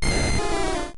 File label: cry; Missingno